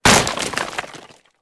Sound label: Bone Destroy; Angry Birds